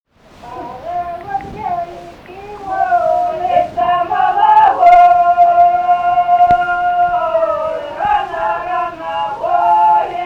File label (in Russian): карагодная; Каменка; Карагод великий, улица мала